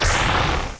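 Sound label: orange hit; ICEBREAK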